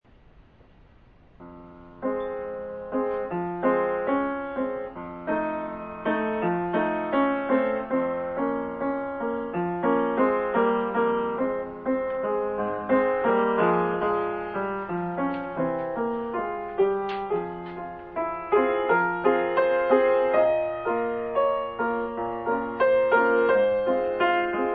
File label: recital